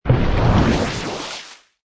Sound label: Magical Summon Water Elemental; Magic Sound Effects; Magic sound of casting spell, conjuring or wizardry. Witchcraft ritual summoning elemental